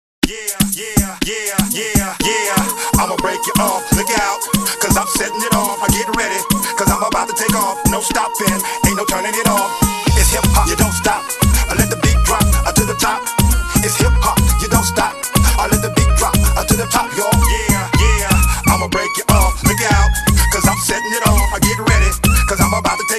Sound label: punjabi hip hop